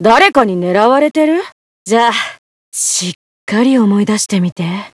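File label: weapon get; svencoop; lappland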